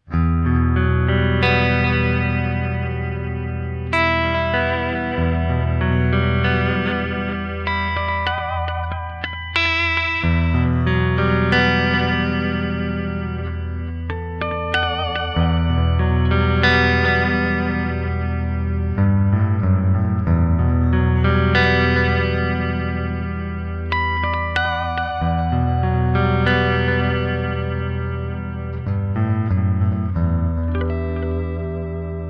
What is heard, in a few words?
Jazzamp Panorama